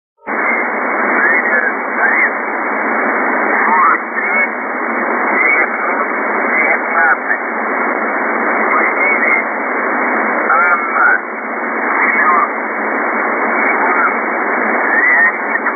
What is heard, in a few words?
uvb 76 buzzer